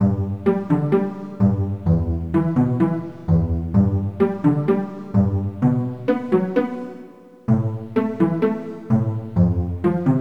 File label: pizzicato, chiptunes